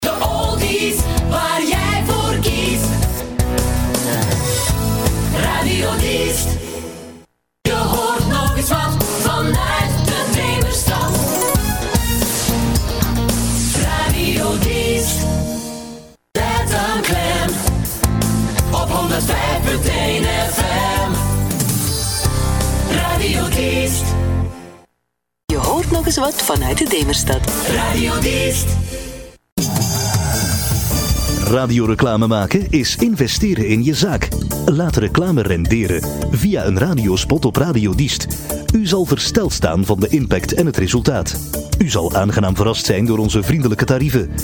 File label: jingles; Diest